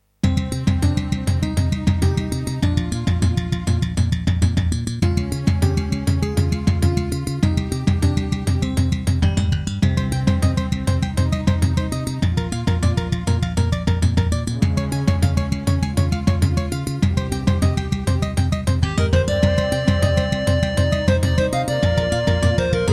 Casio CTK-2100; Hocus Pocus

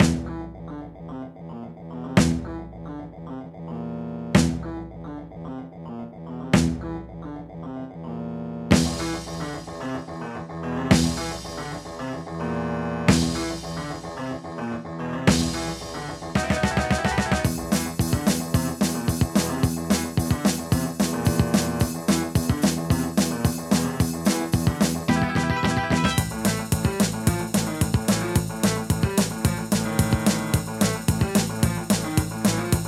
Alesis Harmony 32; Doom

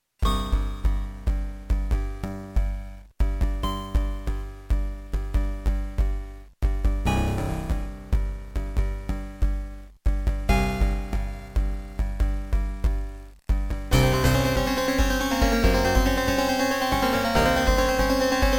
Beepblaster 2.0 BEEPMODE; sigil doom episode 5

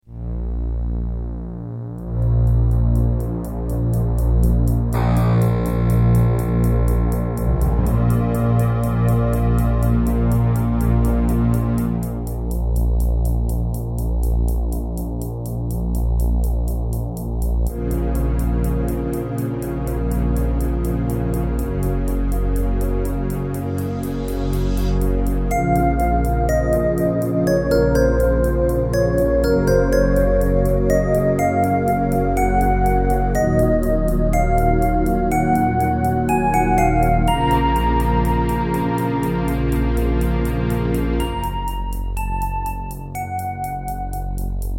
Terratec MiniWaveSystem WT64; Dune II

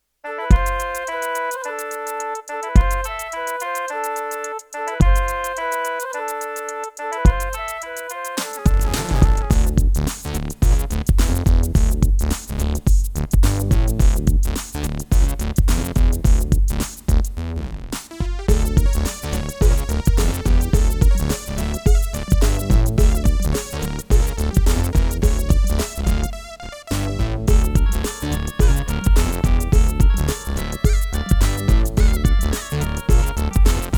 Serdaco Dreamblaster X16GS NATIVE; Lost Vikings

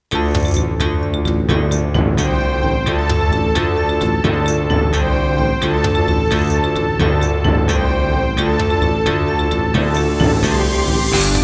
Roland CM-500, wavetable; Heretic

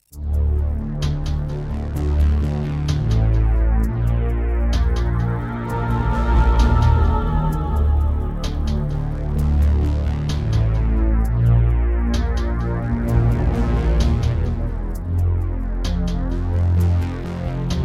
Miditemp DS-48 CleanWave32; Descent II - Briefing

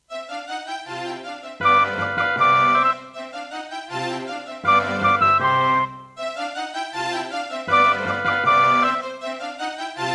Roland SD-50; classical; GS MODE; Mozart; Concerto K467 partial

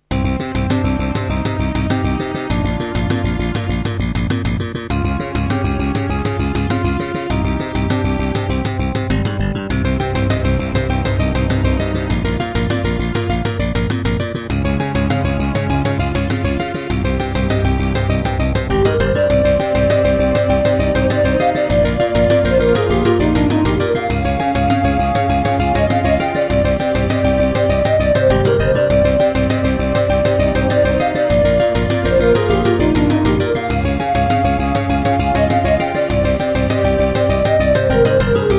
Roland SC-8820 55 MAP; Introduction; Hocus Pocus

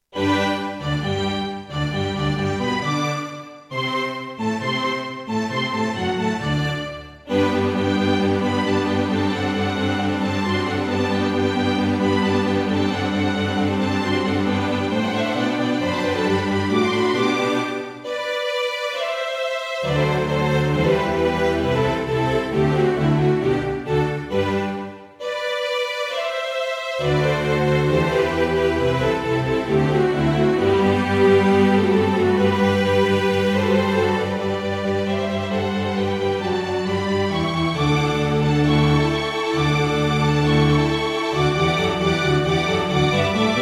Roland SD-50 GM2 MODE; Mozart - Eine Kleine Nachtmusik partial; classical

